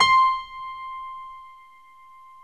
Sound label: DBL GRAND; OMI - Universe of Sounds